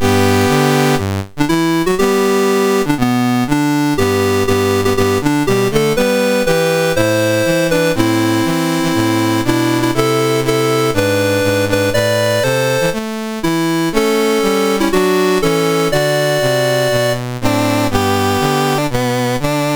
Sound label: MOS6581R4, National Anthems; Haiti; Dave Marquis